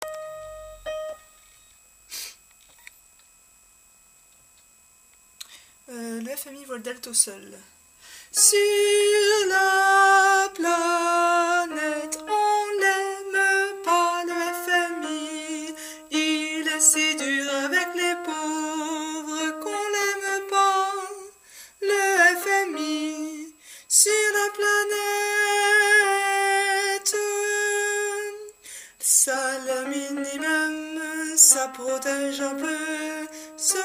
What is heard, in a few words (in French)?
alti, chorale voix